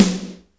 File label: GATE SN, Snare